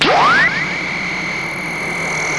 basic beam charge, weapons

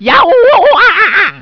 barnacle pull; supermariobros; luigi

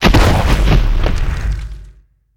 gon thump

